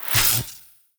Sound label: Wand zap; 100rogues